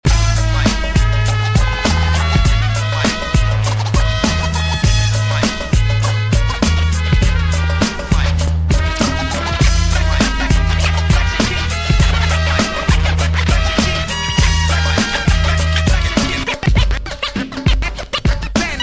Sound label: hip-hop latin mix